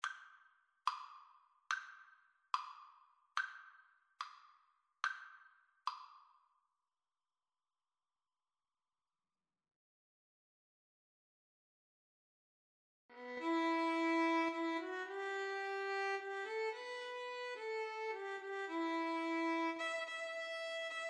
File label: house of the rising sun, Violin Cello Duet